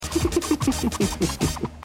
laugh; Raiden; Heh heh heh heh heh heh.

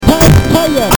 sf fireball; Ryu and Ken's special moves; American version

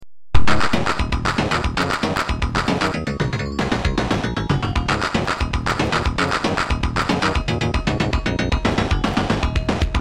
cart music level 7

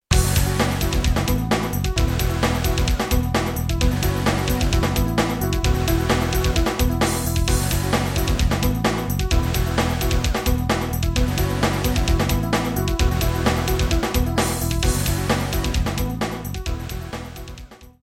music victory; hulk

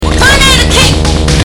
tornado; aof2

